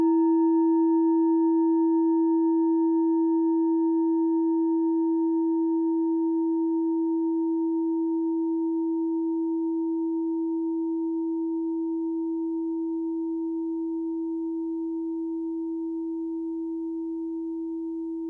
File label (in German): klangschale set; Durchmesser = 19,5cm; Höhe = 9,3cm